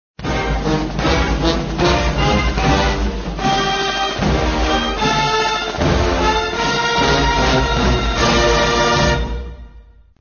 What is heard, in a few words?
Fight Song Fanfare; Arkansas-Pine Bluff